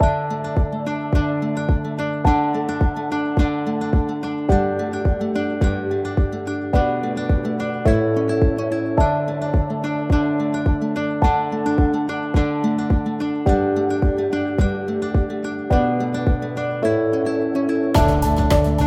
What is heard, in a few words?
Inspiring Guitar